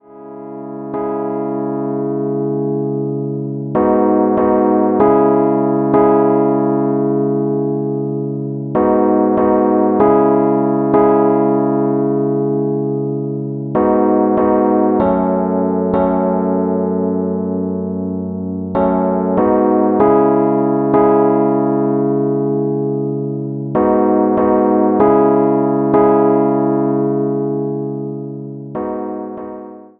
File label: Rhodes chord, hip hop chords; rhodes chord 17